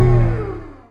Railgun Stop

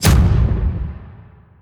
Double Coilgun

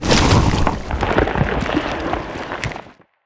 swallow

